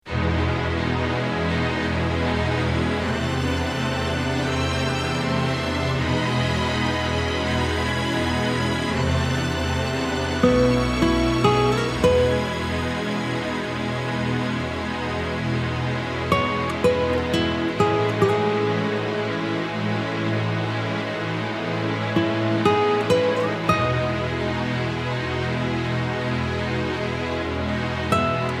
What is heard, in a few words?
Instrumental